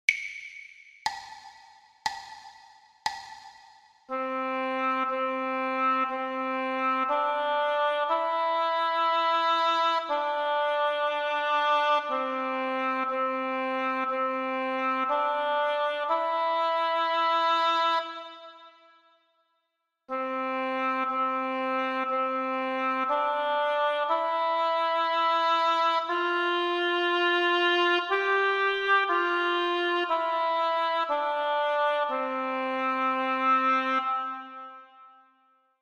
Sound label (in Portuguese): percepcao ditado